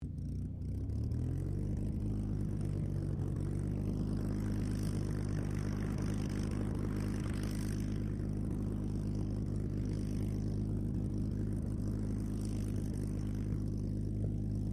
AIRCRAFT SUPERMARINE SPITFIRE MK-XVI PREFLIGHT DISTANT; Airplanes; Airplane Sound Effects